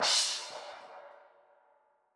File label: Trash E, drum